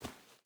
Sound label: footstep barefoot dirt walk; Rust